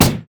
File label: red mech melee basic attack; minions